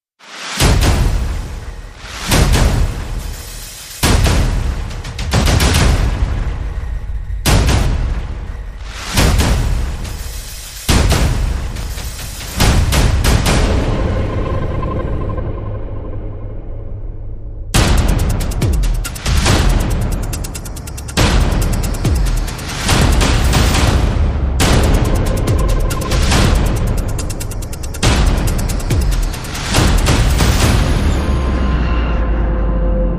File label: Percussion and FX